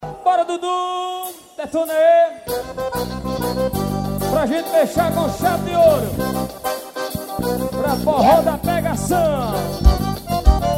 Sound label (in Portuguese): forro